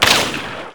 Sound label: desert eagle